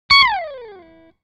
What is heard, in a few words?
Electric guitar slide